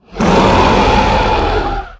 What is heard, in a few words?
Swamp King Play; Swamp King Dred